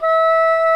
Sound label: WOODWINDS